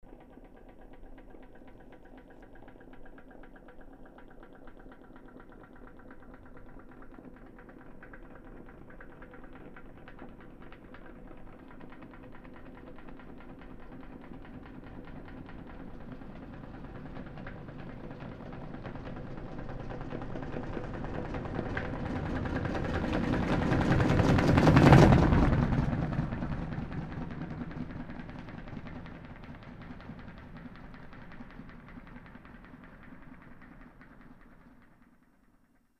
Car Tire Flat By Fast, Car Tire Flat; Start, Slow To Fast Roll